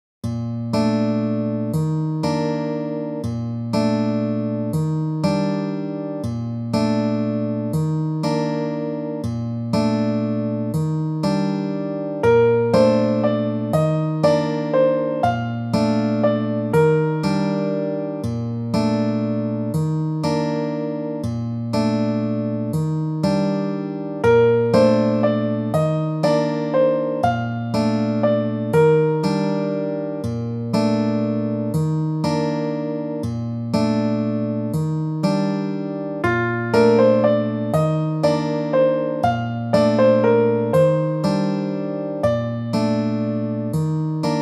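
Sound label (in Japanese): 散策（BGM）; ロイヤリティフリーBGM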